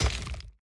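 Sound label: player Gospel Damage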